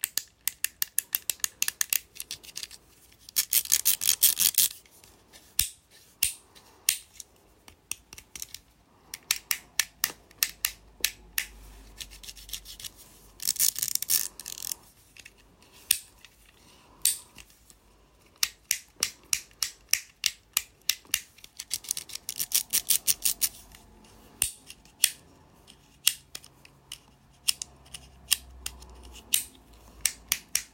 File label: fidget pad; Golden Crayon Sounds